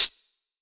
mod hat, Synths and FX, Mod Drums; neuro; Sinistarr

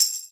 RHYTHM; PERKUSSION; Alpine Volksmusik

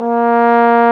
BRS TB, trombone; Roland L-CDX-03 Disk 2; BRS 550 T.Bone

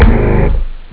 heavy guitar; ST-XX Amiga Soundtracker Sample Packs

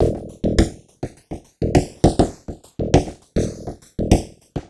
Drum Fx Loop, Electronica

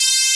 PWM C4; Analogon

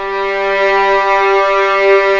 G3 EXP MF, VIOLINS; East West; The Ultimate String Collection

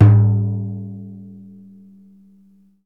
deff open; Deff Bendir &Req

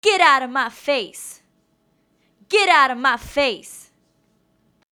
Vocal, Infinite Soul; Sonic Foundry (Sony Creative Software); Vocals

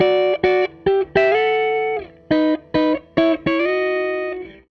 Gretsch Guitar Loop; Sonic Foundry (Sony Creative Software); Six-String Orchestra

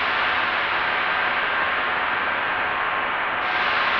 sparse soundscape samples; ReLooped

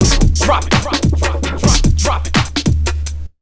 AMBIENT 147, Big Beat Drumloops

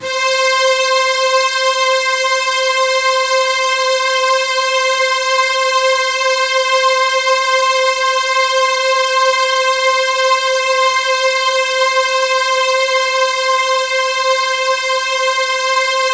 C5, Synth Line; 90 s Sample CDs; NiceBeats; SynthLine VOL-15

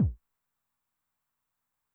BD High Tune Med Decay, 909mod, BASS DRUM; classic machines

